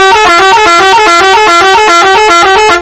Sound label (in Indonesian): klakson telolet; efisiensi